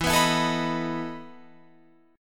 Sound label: E Major 7th Suspended 4th, Guitar, Open F